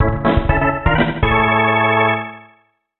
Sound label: Win Jingle; kiki trick wii soundtrack